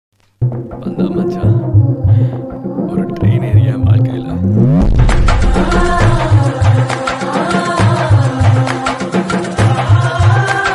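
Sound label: sad background music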